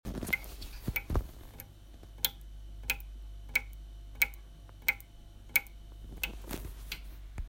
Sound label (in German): Geräusch